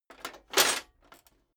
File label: metal plate armor movement single